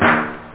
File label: timbale, snare